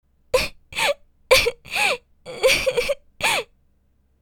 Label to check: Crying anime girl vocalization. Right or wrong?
Right